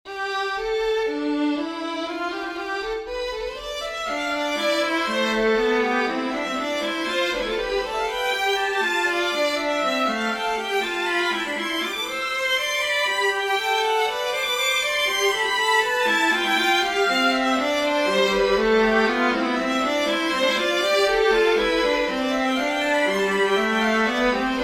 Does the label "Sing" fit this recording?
No